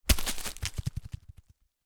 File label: impact rock fall hit ground dry leaves then roll away